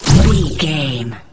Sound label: sci-fi weapon blaster laser boom